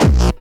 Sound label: BD Distortion, Bassdrums; Vengeance Samples